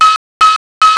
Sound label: Adjust; OpenBVE